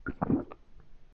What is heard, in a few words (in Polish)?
polykanie ptakobestia